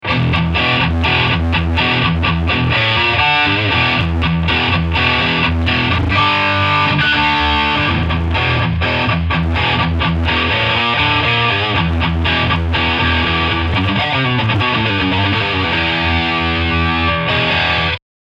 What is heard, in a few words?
Riff Distortion Morph UK